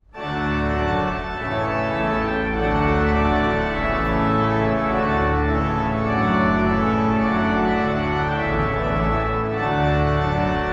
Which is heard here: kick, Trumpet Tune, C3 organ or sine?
Trumpet Tune